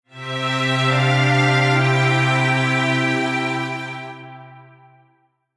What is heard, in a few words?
Strings Poly Hamo